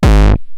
Roland TR-909 BD; BD Selection

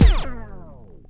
scratches; Akai MPC-500; Perc

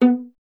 viola B; Miroslav Vitous; Solo Instruments VOL-1

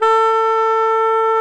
A3, tarogato; SoundBytes - Early Patches

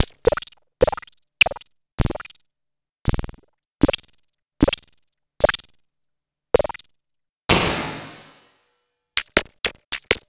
Weird Hats

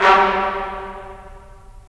BRS, Tps Stacc; Roland - LA Composer vol. 4

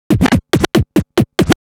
Scratch Fills 140BPM

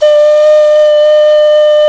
D OCRNA, CLAYFLUTE

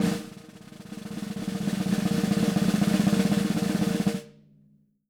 SN ROLL, SD ROLLS, Living Drums

